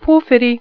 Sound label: powhiri; auckland